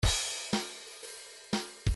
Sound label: swing 1 bar